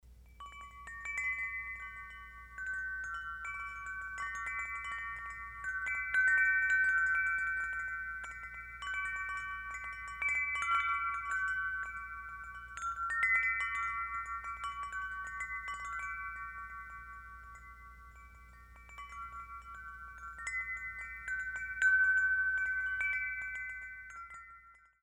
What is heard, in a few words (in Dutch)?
Windgong Pluto Evergreen 68cm; Woodstock Chimes; Dit model Woodstock Chimes windgong heeft als thema de planeet Pluto.